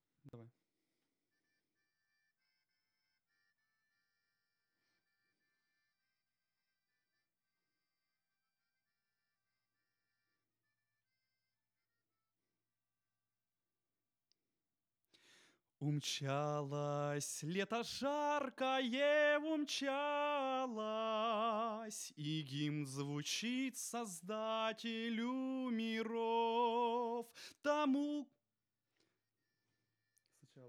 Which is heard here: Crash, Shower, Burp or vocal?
vocal